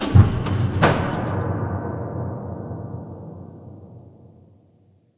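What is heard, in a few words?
industrial ambience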